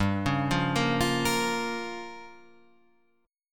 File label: G Minor 7th Flat 5th, Guitar Half Step Down